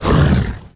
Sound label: Monster Attack; Monster Ralph Attack